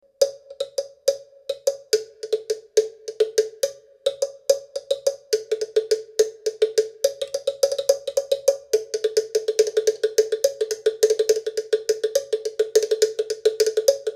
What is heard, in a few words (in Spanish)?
concha tortuga